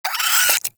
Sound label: Cryptilex Vocal Attack Short; Characters; Warframe; Hunting Critters